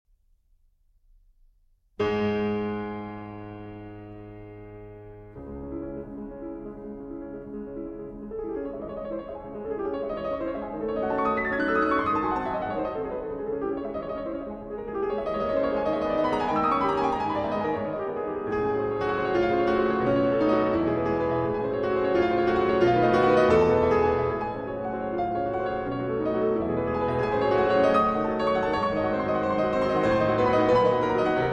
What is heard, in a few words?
Fantaisie Impromptu in C-Sharp Major, Allegro Agitato